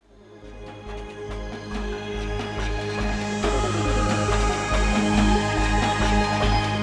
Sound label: Fadeup; Unreal II The Awakening